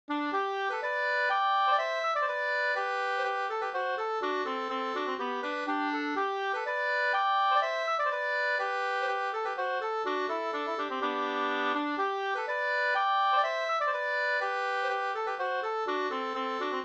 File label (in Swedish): hamburska